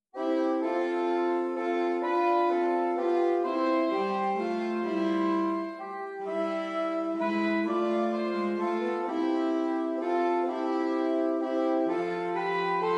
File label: Sax TRIO; saxophone trio